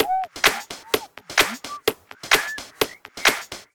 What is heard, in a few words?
Loop, 128 BPM